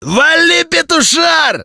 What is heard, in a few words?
attack; metrocraft; customnpcs